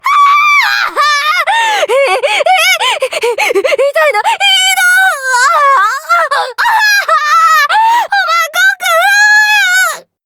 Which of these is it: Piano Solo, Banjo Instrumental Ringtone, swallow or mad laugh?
mad laugh